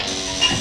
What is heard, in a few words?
BUTTON; Best Service; Advanced Mega Effects